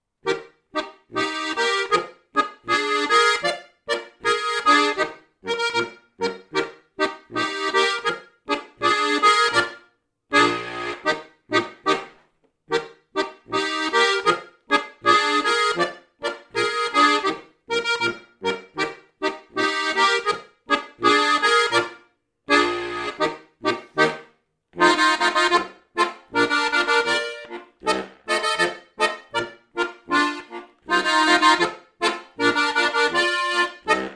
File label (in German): Boarischer